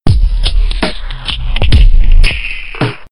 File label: (Jingle) Outro (Unused); rayman 2 remastered soundtrack